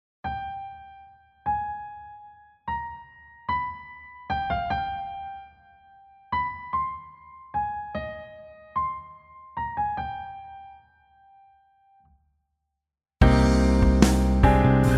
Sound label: modal interchange